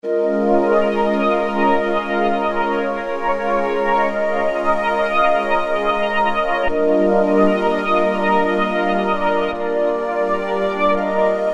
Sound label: Amber Pad